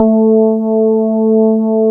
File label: DX PIANO; East West; Twisted Textures-BT